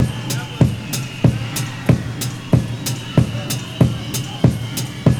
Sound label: LIVE KICK; Univers Sons